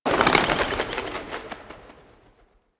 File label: Birds Take Flight; Warframe; Ambience; Nineteen Ninety Nine